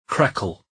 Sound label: crackle